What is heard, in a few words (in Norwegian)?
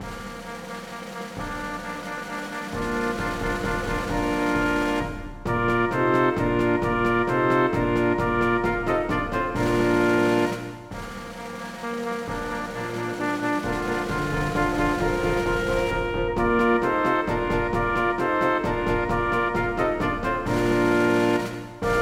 Messingkvintett ad.lib. trommer